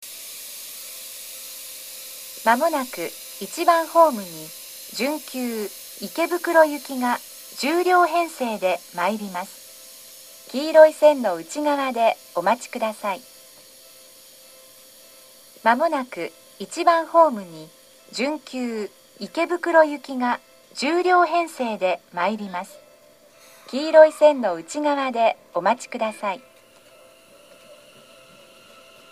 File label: semi express; kotesashi; ikebukuro; seibu